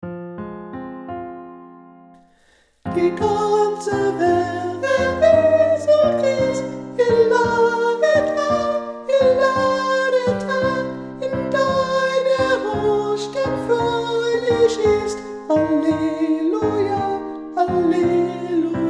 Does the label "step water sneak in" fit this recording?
No